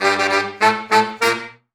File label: Horn Sect Effect